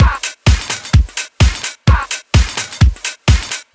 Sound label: House Beat, 128 BPM